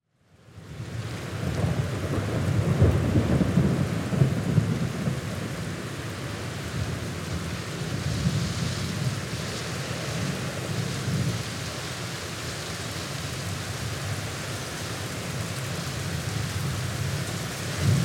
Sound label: Courtyard rain in Tasmania